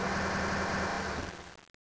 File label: Air High, Train; OpenBVE